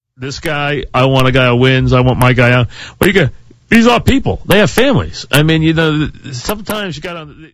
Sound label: BURP; WFAN